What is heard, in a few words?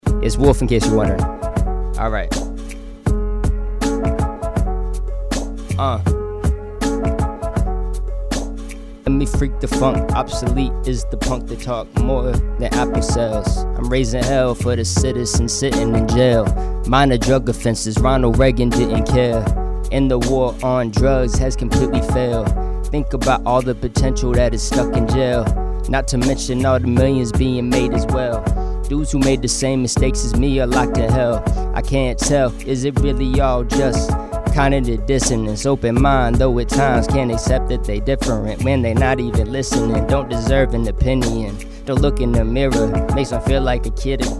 Rap